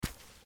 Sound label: biped walk